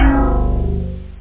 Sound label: bang; da capo vol1; instruments; synth; bass